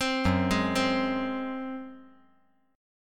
Gb Suspended 2nd Flat 5th 5G,4C,5E,5A

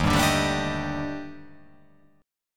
D sharp Major 7th Flat 5th D sharp, chord

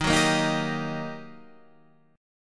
G Minor Major 7th Sharp 5th, Eb

